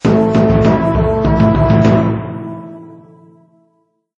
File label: goblin arena jingle